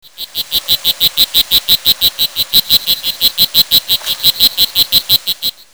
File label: D pumilio, chants